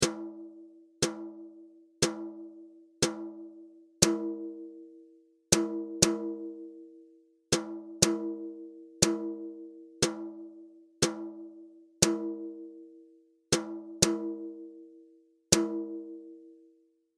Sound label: RHYTHM; traditional notation